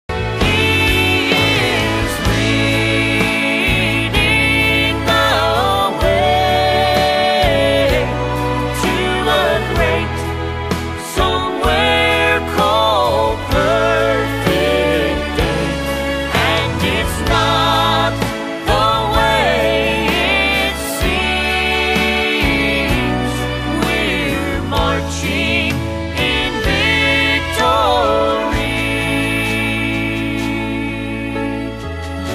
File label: Marching In Victory